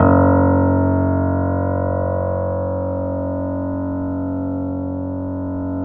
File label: F#, The Piano; InVision Interactive - The Piano; BOESINGSOFT